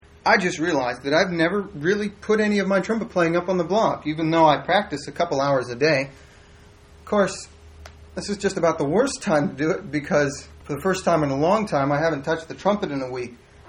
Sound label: mandolinata